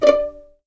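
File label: D5 pizzicato mezzo forte right, violins; East West; The Ultimate String Collection; VLNS PIZZ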